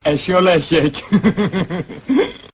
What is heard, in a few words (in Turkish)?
hihihihi; kemal sunal